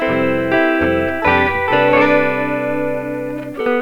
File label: drops